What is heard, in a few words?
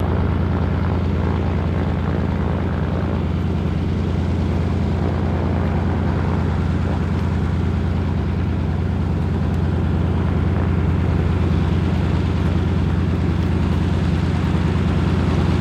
Prop Plane DHC, de Havilland Canada, Single Engine Medium Steady